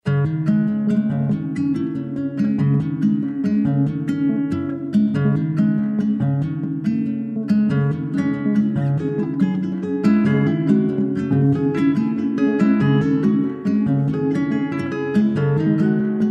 SAD ARPEGES

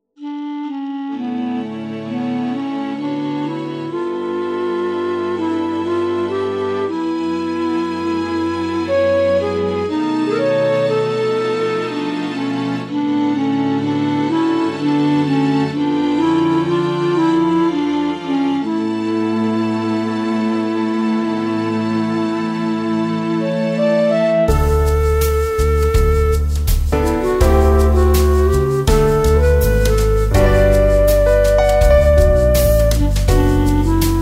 SOUL CLARINETTE

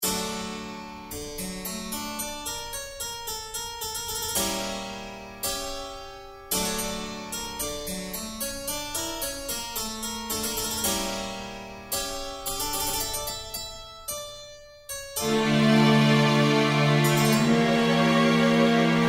THEME INTRO BAROQUE COURTE

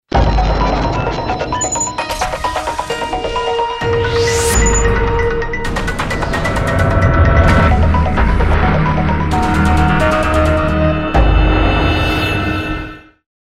TRANSITION INSOLITE

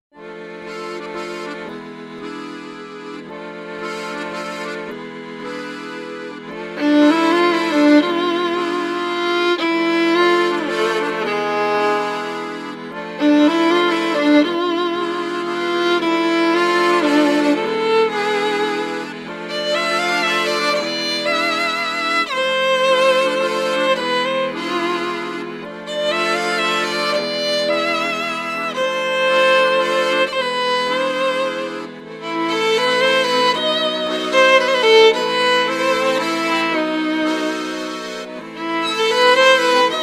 ACCORDÉON VIOLON

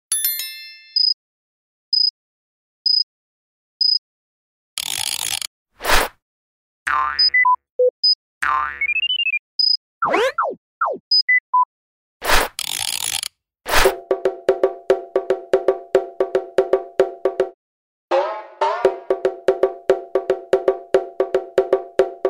ANIMATION

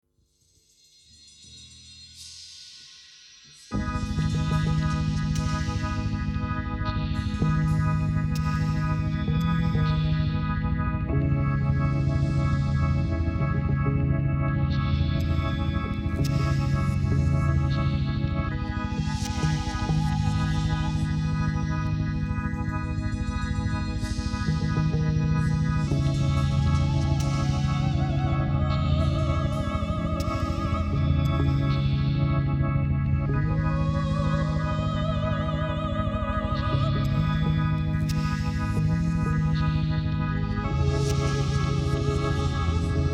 orgue bassise

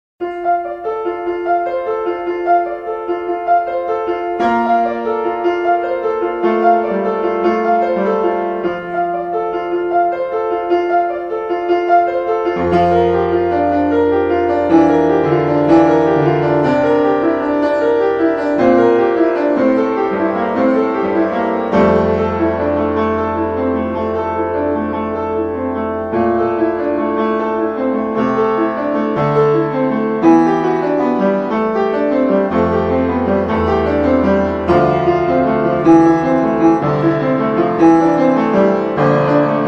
PRELUDE 13 EN FA MAJEUR

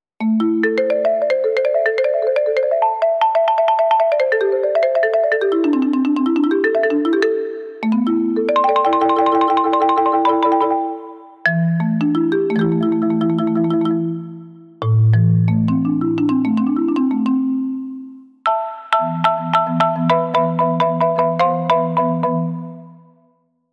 MARIMBA DES MERS DU SUD

